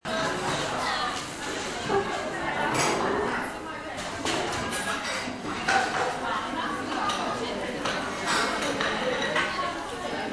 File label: bang clatter restaurant